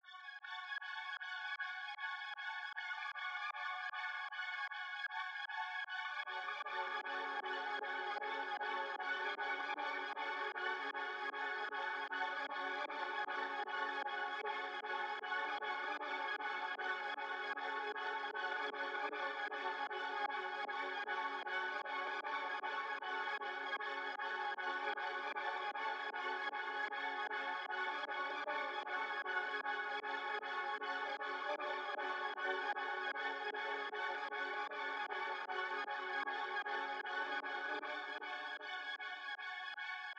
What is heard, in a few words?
154 BPM F# Min Pad; Melody; Trap; Loop Stems; Cymatics; 2022 Melody Collection; Hostage